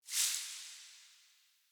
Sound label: Talisman Courage Burst; Cosmetics; Talismans; Founders Talisman